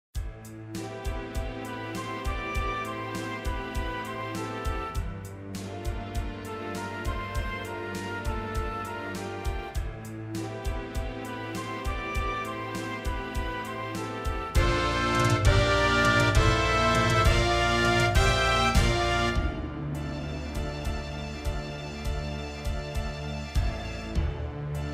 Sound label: Prelude For Orchestra